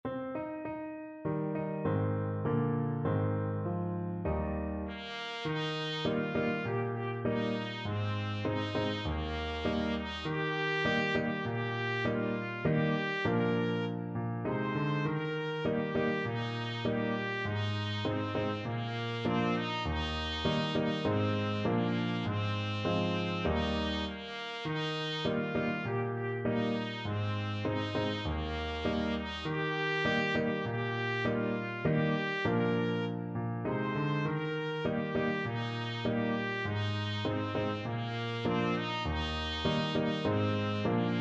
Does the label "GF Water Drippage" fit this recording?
No